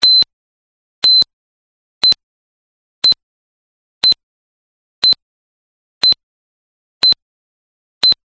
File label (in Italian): impulsi rimodulati, dcf77